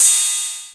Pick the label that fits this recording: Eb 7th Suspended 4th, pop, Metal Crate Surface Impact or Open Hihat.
Open Hihat